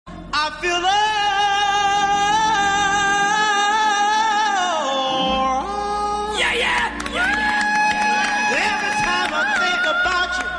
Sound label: shout